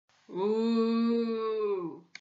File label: uuu